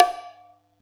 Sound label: Yamaha EX5, Drums